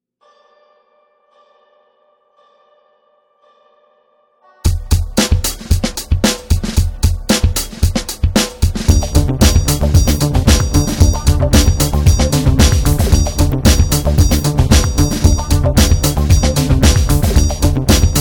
banjo funk

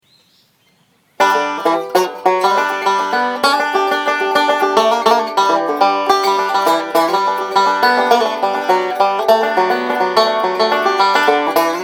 banjo

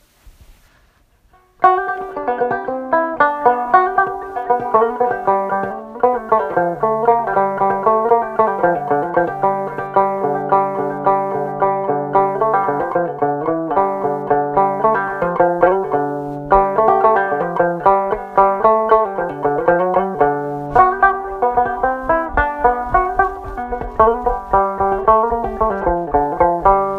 gourd banjo

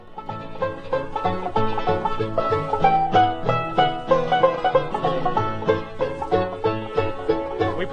e lick, banjo

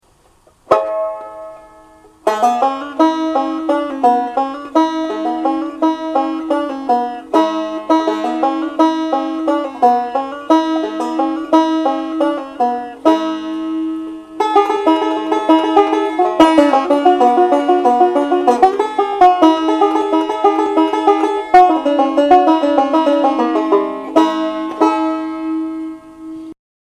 banjo

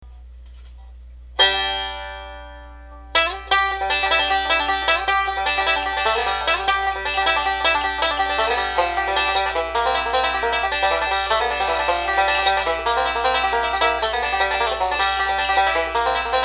pick along, banjo

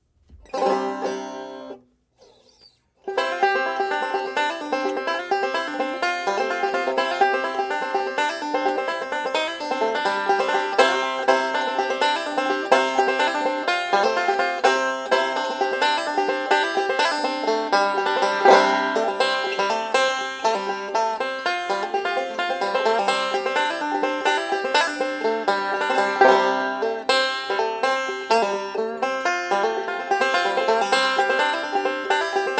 banjo

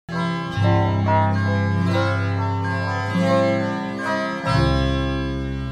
chromatic

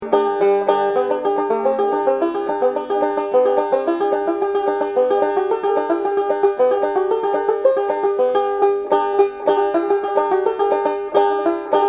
banjo

